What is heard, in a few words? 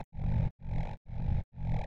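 breathe bass, E minor; loops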